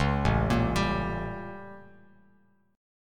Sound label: A 7th Flat 9th A, Bass Standard; A7b9 arpeggiated; JGuitar